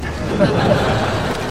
short audience laughter

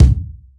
rich lows kick drum one shot d sharp key

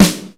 warm snare sample g key; snare drum samples

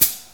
sizzle high hat f sharp; 80s; hi-hat; drum single hits one shots; hat samples

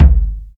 subtle reverb kickdrum g key

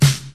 fat acoustic snare sound C sharp key; snare drum samples

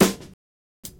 huge snare b key

